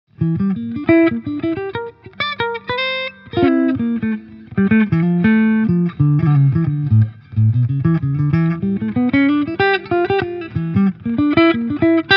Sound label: Gretsch G5422TG Electromatic Hals Jazzy